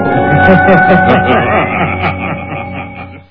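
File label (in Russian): жуткий смех массовый мелодичный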